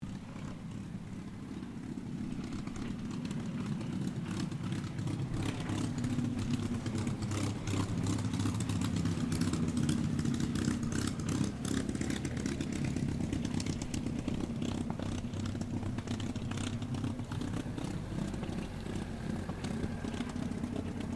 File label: AIRCRAFT CAC CA-18 MUSTANG MK23 TAXI SHUTDOWN WINDY; CA-18 /P-51 Mustang; Airplanes (Sound Effects:Vehicles)